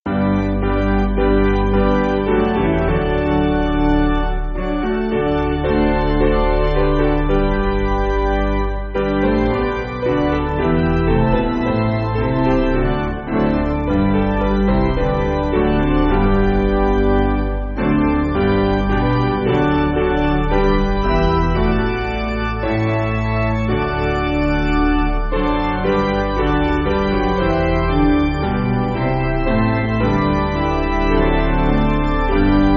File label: Piano